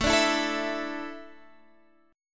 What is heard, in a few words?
A Suspended 4th, Guitar, Standard; strummed; capo 3; 17,17,14,14,17,x